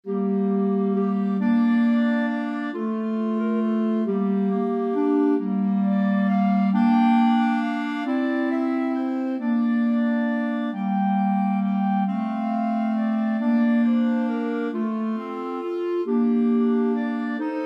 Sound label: clarinet trio